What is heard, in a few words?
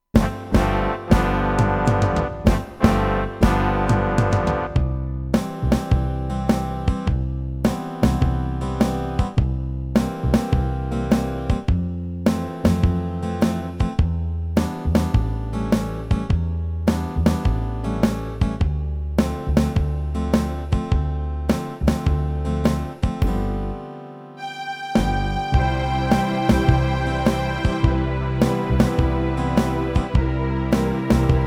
orch.; zongora